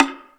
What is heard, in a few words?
Percussion, Drum Machines E-MU Pro-Cussion